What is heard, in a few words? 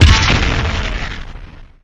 Grenade Launcher Shot; SFX Kit; Weapons